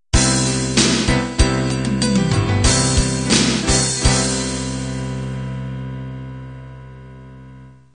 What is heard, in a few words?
rock ballad end